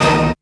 orchestra D#4, FAIRLIGHT; Best Service ProSamples vol.55 - Retro Sampler

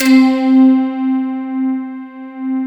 PICK C4, Synth; LITPICK; Big Fish Audio; Synth City; JDSTACKY

